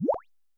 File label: Soothing Waterdrop Click